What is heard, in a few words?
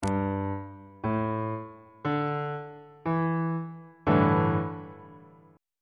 a dominant 7th suspended 4th 3rd inversion; bass clef